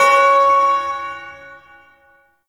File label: Shawzin Oct Two C Sharp; Music; Warframe; Duviri; Ersatz Shawzin